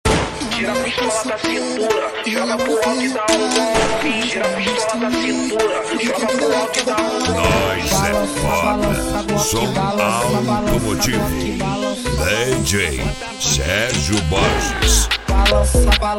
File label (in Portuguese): eletrofunk; som automotivo